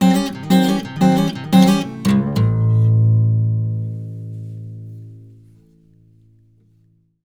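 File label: baritone lick A, Blues Guitar; ILIO; Fretworks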